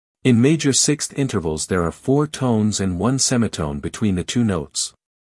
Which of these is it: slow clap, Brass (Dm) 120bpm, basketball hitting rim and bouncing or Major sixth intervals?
Major sixth intervals